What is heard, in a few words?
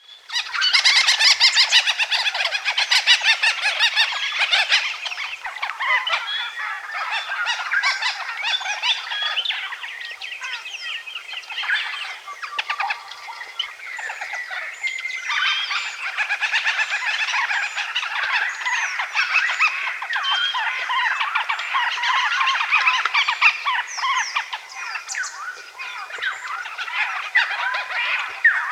Hall's babbler